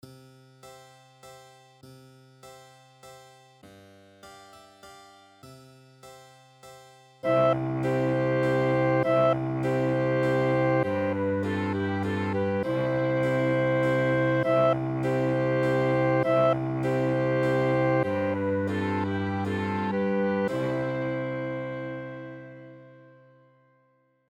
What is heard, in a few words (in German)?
Menueett fuer cello